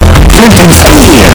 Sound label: flint and steel